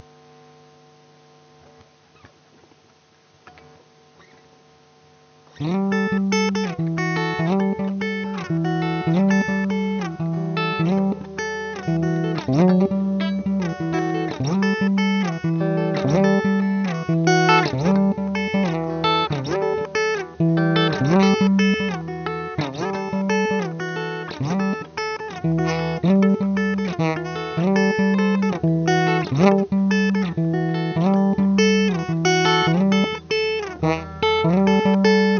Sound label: guitar